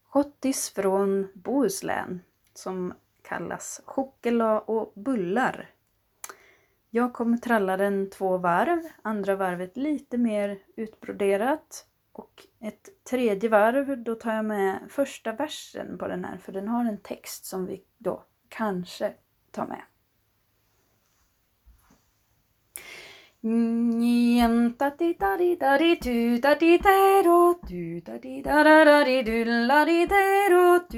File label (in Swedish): schottis från Bohuslän; chokela och bullar